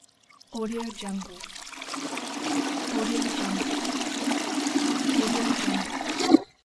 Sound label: toilet drain